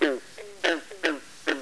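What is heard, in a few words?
green frog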